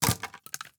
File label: plastic break